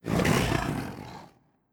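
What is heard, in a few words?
Huntress Run Tiger voice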